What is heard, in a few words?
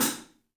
3D Drums, TIGHT ZILDJ; 3D Sound